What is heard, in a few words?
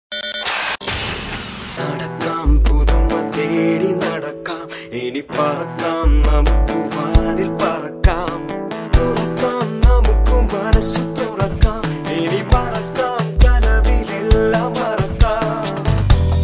jingle